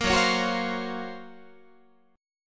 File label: Gb Major Sharp 11th Gb Guitar; GbM#11 strummed